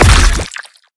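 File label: fear cage impact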